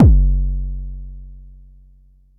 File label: Bassdrum, Jomox Xbase09